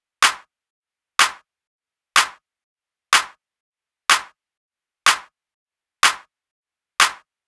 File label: Melodic House Kit 124bpm Clap; EDM Drums; Drum Kits; Stems; Ghosthack; Ultimate EDM Drums